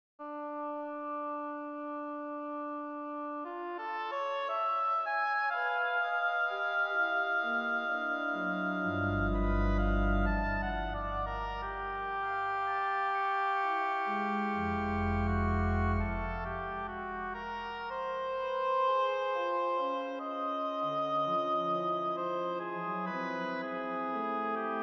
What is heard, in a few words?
Oboe Organ; Kropfreiter; 3 pieces for Organ and Oboe; Meditativ